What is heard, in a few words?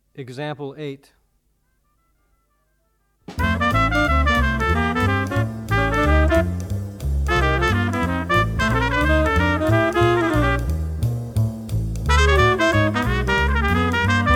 two horn audio examples